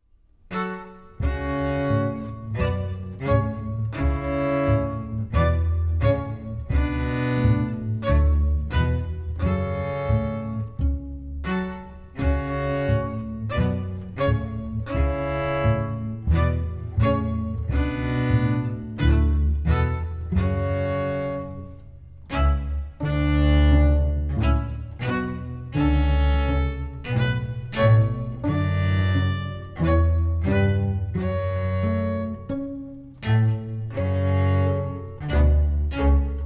Slow Passing orch

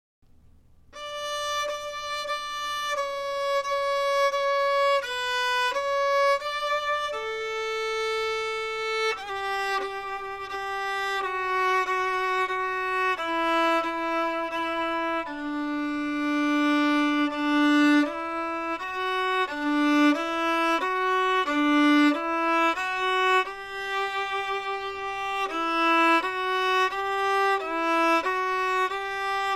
French Adv Violin

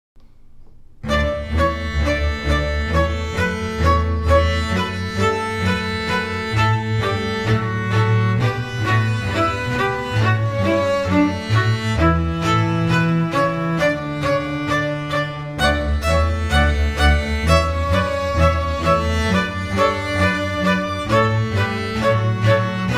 March tutti; Graduation March

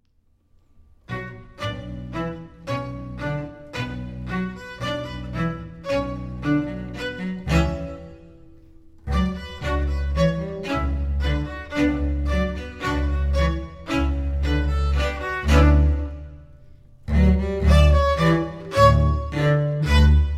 orch; Argument

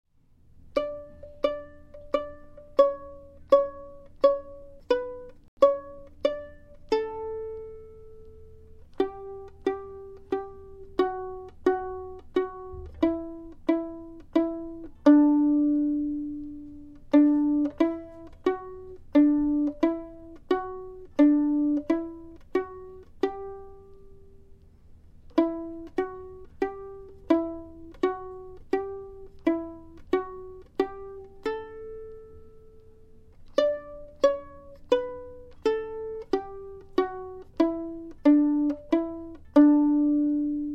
French Pizz Violin

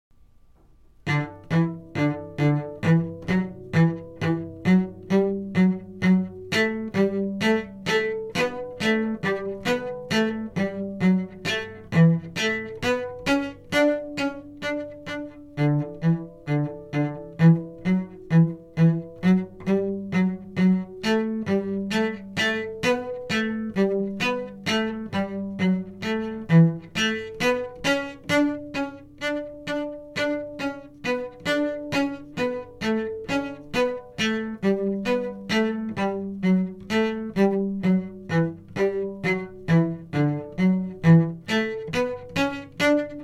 March Cello; Graduation

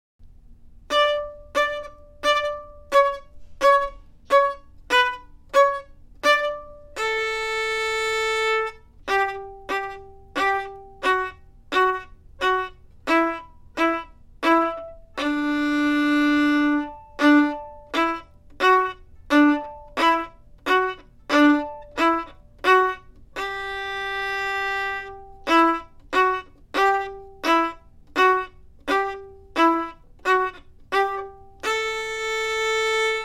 French stacc Violin

